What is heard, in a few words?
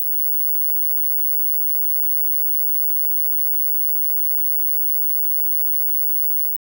electronics